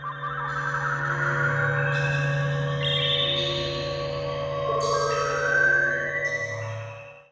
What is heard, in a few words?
crystal